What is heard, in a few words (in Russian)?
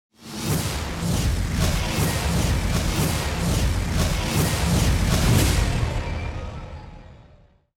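mnogokratnaya magicheskaya energiya letit cherez zerkalnyi effekt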